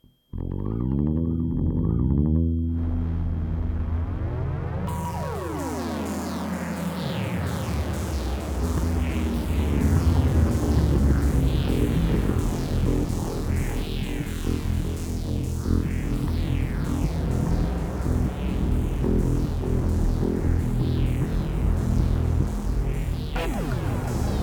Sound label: bass intro